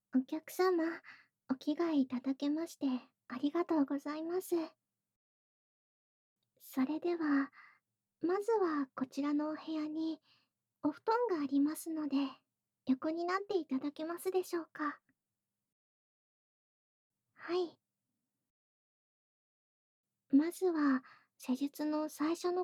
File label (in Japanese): あったか膝枕で耳かき